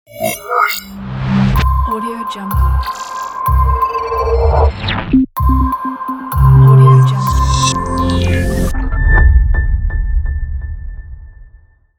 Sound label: electronic glitch logo